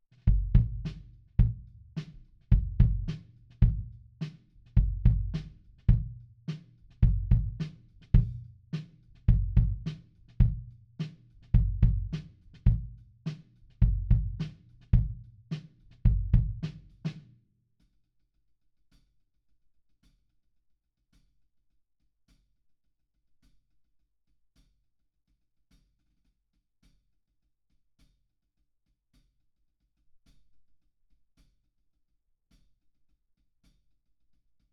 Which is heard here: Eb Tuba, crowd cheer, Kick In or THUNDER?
Kick In